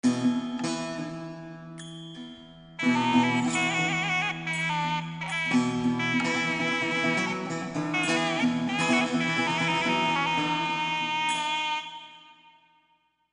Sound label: Islamic; Civ4 warlords; Buildings